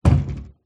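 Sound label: Cabinet Door Shut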